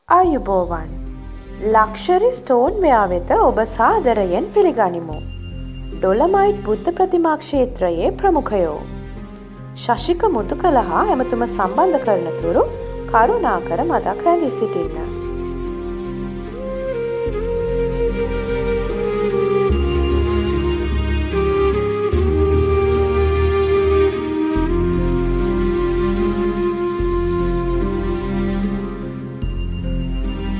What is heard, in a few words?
female